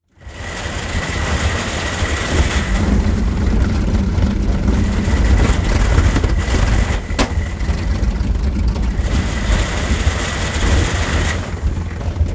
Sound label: engine broken, tanks; War Thunder